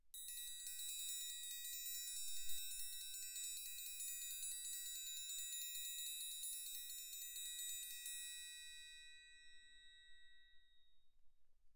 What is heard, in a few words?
6 triangle, roll, mf; Percussion; hand percussion